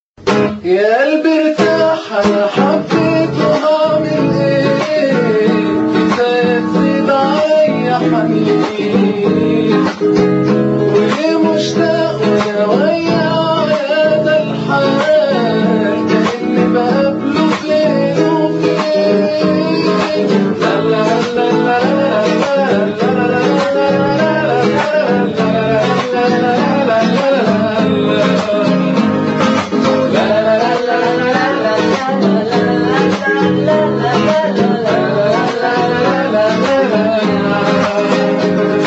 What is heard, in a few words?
Guitar; egypt